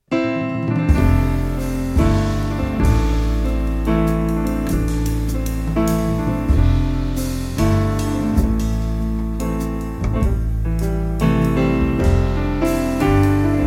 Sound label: bass below